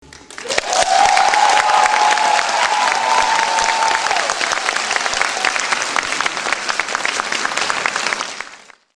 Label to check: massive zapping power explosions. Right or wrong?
Wrong